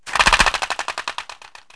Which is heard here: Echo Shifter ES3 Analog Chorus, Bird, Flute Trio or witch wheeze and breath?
Bird